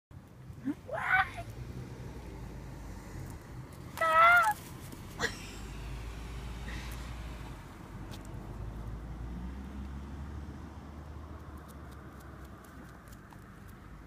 popugai kea nestor notabilis wild kea parrot. milford sound. new zealand